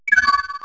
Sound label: chime; Dystopia